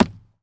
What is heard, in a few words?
Baseball Hit Wall